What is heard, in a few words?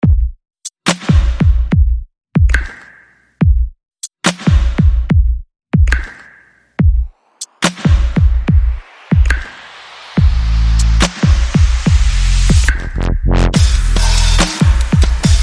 Percussion Bass and Effects